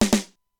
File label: SN, Simmons SDS-9; drum machine; Factory C5